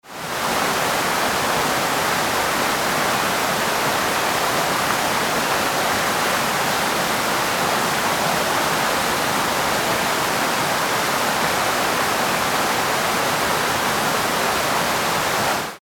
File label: waterfall